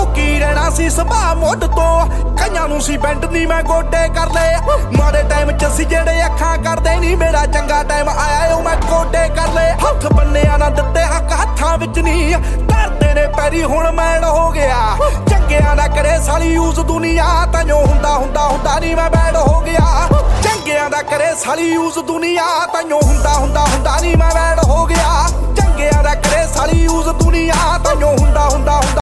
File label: punjabi ringtone